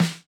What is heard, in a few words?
snare, snare house